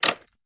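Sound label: gear rattle weapon large; viper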